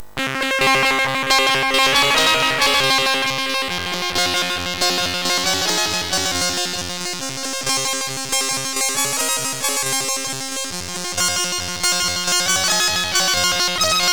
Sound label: Hocus Focus, MOS6581R4